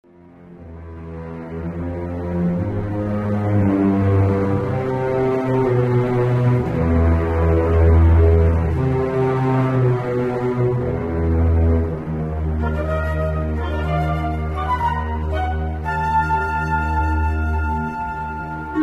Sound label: suspense, music; mohaa